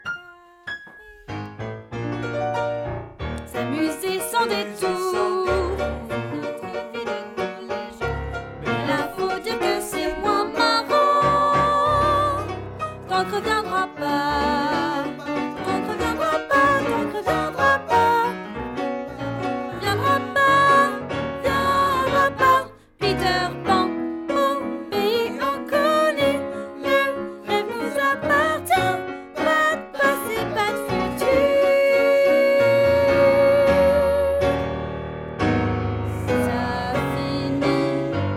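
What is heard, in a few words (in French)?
Sop, soprano part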